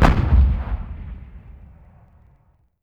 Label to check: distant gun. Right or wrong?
Right